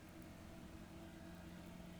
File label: pulses, BALAENOPTERA; MONACO 2022-07